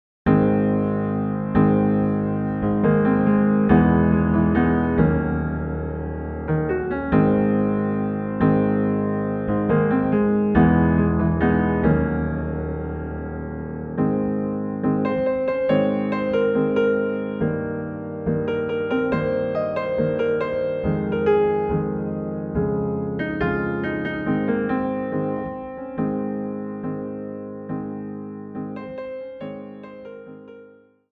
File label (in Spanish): piano solo